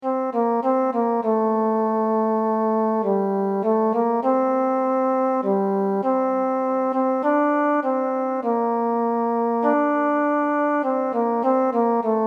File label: tenor